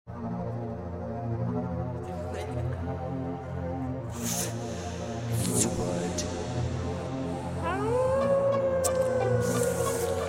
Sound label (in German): horrorszenario; MIDI